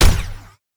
gunshot

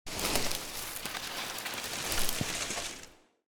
branches grow

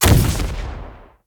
robot fire

